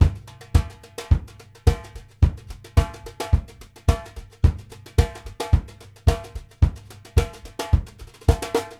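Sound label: 4 ON D FLOOR, BRUSH 108; Sampleheads - New York City Drumworks VOL-1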